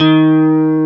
GUI STRATO, E-Guitar; E-GUITARS

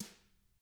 TAMA drums; ILIO; Double Platinum Drums 1

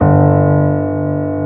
PIANO G1; PIANOS

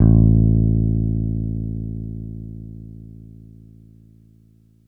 Big Synth Basses

